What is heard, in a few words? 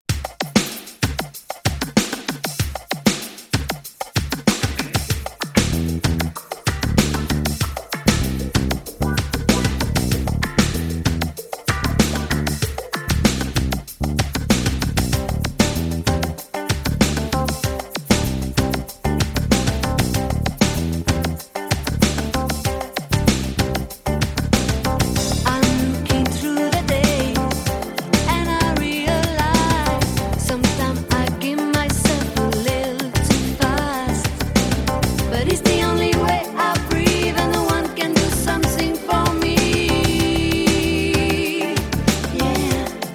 Bass